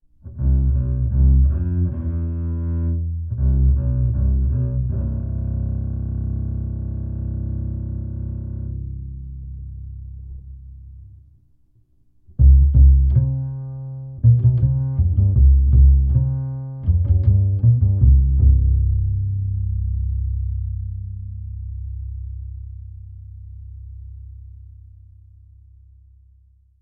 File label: upright bass dtp640 rex condenser dual efr; lewitt